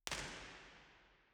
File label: First IR Sala 2800 Dodec Center WY, Soundfield; Santa Cecilia Roma; Measurements 2005